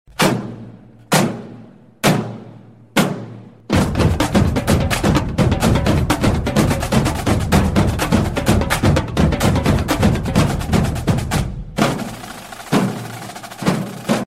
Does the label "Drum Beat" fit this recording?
Yes